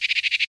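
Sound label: tropical bird; Counter Strike Model Pack; CS:GO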